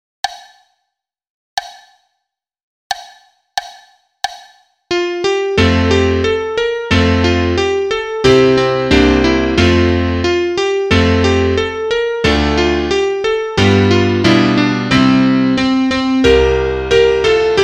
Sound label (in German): Klavier Solo, trompete; Etüde